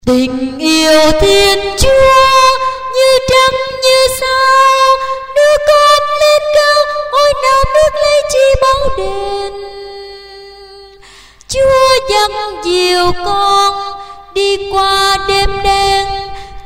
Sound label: sop alto